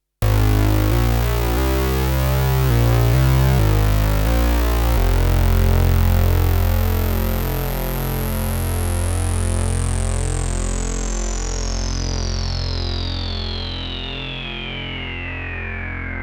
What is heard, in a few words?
envelopes; crowbx